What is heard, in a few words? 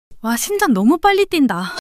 fluster long fluster